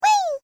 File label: Created Creature Small Call, Alien Sound Effects